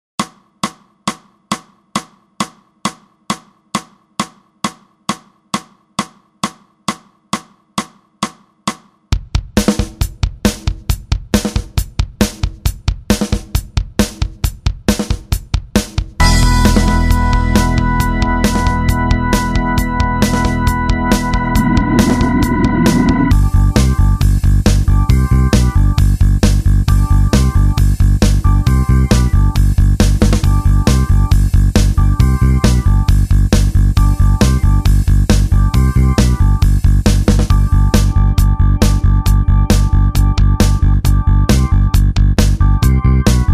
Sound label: guitar backing tracks